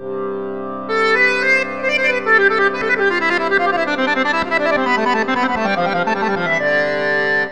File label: Acord